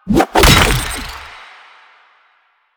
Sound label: Med Station Plant; Warframe; Lasrian; Lasrian Soldiers; Weapons